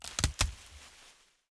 foliage break; teardown; ps5 windows xbox series xs

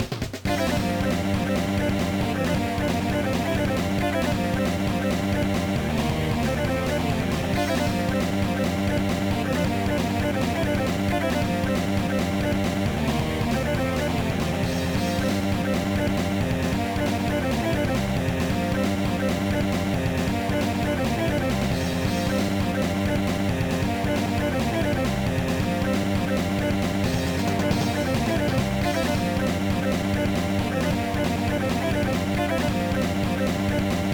BGM; Genseishin Justirisers Souchaku Chikyuu no Senshitachi; GBA

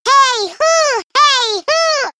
Classic Miss Sound; Jolly Battle

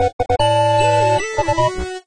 success; Super Metboy

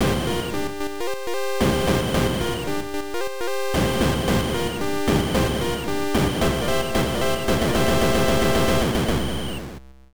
Base Reached BGM

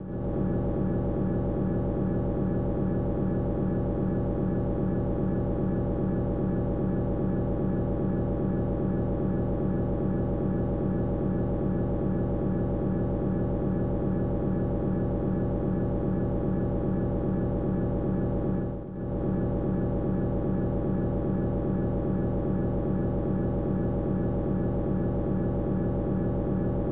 Vehicle Rumbling; Rushing Beat Syura; SNES

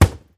plastic hit

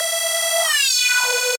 Beat

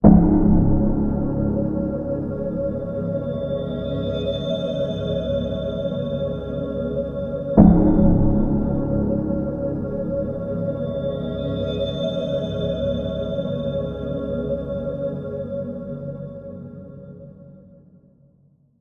Field Jingle

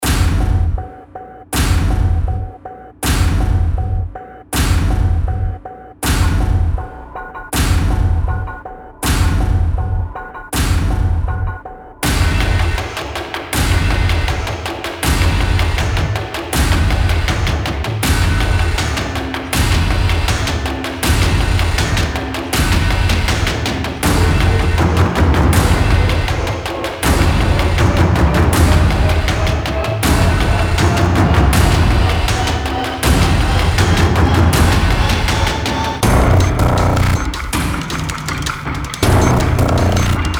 Action; dead effect 2 gamerip 2016

